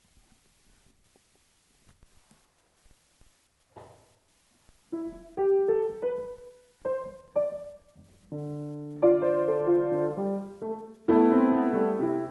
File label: JAZZ piano imp